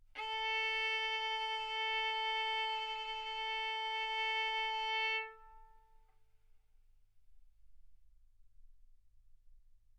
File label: Violin arco mf sul A A4 B4 stereo